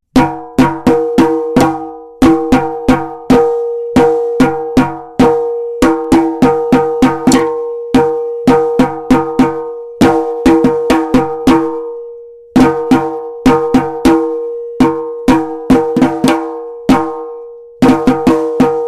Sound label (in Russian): звук барабана